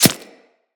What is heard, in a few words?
Arrow Impact Flesh; Weapons; Bullet Impacts